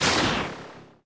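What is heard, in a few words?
light wave; moonchld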